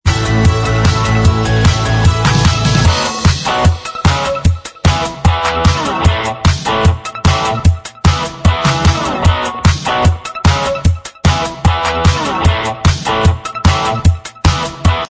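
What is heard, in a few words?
Instrumental Track, backing tracks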